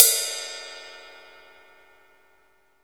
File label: RIDE CUP, Ride Cymbal; MONO RIDE CYMBAL; AKAI S6000 CD-ROM - Volume 3; MONO RIDE CYMBAL 2